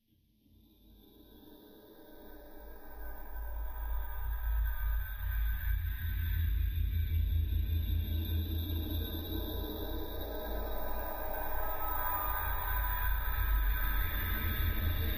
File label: Metal Bow Atmos, bowed metal